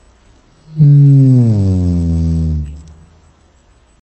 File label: Dryosaurus, dinosaur roars